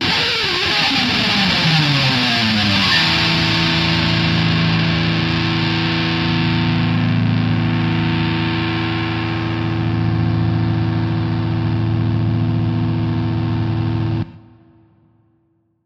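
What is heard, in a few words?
Electric Guitar Plectrum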